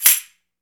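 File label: CARNIVAL SHAKER, Latin Shakers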